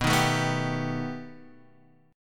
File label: B Augmented 7th B down Guitar Drop D